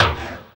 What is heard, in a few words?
FX Dance